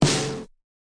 Overhead Snare Crunch, DrumKit; REAL LIVE KITS; Osama Qureshi DrumKit

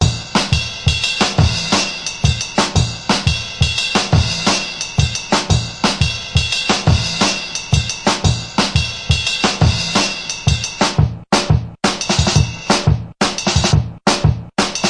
drum n bass licks; DnB; Alaska and Paradox Sample Me DnB

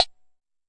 Jomox MBrane drum machine; Jomox MBrane 11

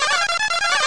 crazy making loopy creature, looping ambience

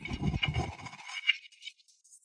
descending wobble; LOOPING AMBIENCE

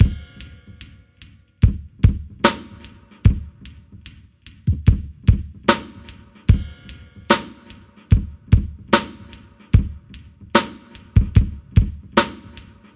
74 mellow dreamer, drum loops and breaks; 000 to 080 bpm

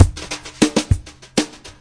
99 3 4 brush loop; 091 to 100 bpm

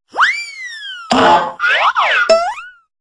cartoon whistle bonk broken string; Cartoon FX

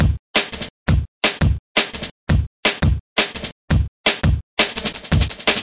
170 bpm basic hardstep, drum loops and breaks

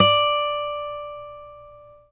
6 String Steel Guitar D4; six string steel guitar

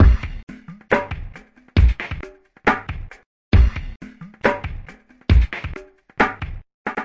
slinky phaser, drum loops and breaks, 068 bpm; 000 to 080 bpm

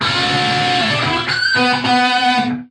straight up guitar lick

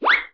vintage whistle zip, Cartoon Sound Effects; Cartoon Sound Effects Kit

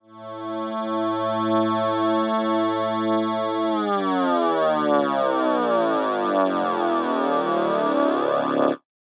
drag, glitches growls words and tones; Ze Franks Pain Pack

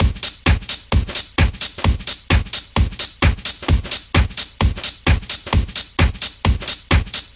130 bpm crunchy handbagger, drum loops and breaks

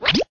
vintage varoop zip; Cartoon Sound Effects Kit

